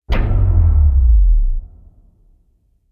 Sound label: Lunge Hit Downer; Ninja Tracks